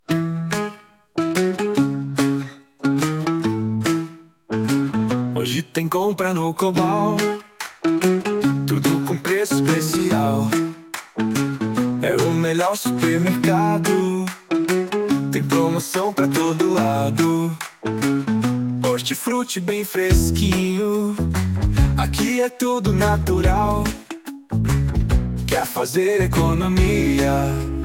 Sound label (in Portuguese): Supermercado Jingle; Cobal